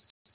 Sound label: Reminder, police; SSP2000